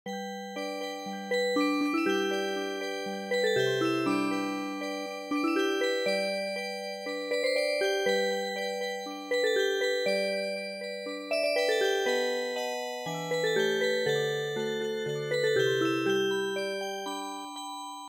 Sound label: trail to mexico Music Box